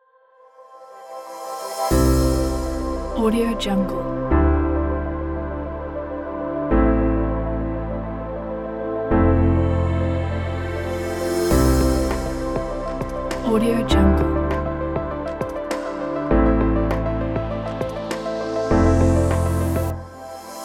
atmospheric dreamy chillout ambient; alphastudiomusic

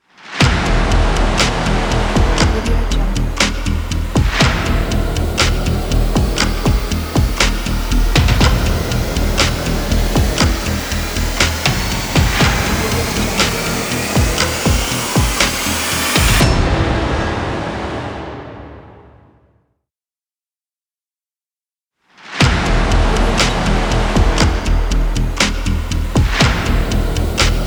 epic countdown logo

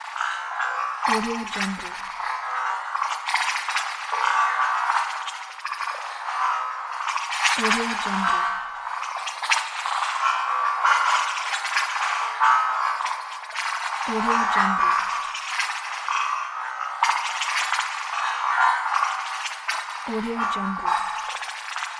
alien computer data transfer

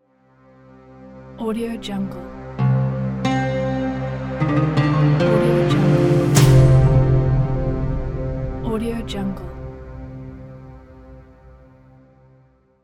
arabic logo; wirahma

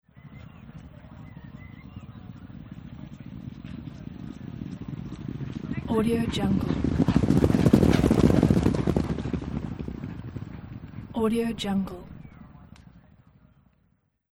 horse racing the pack gallop past

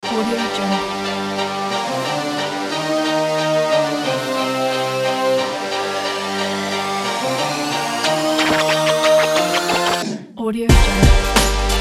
the sport

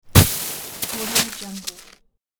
snow boulder impact roll

